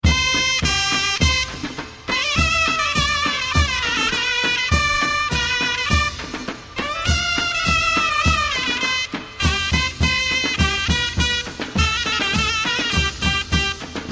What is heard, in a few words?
Mehter Marsi Trap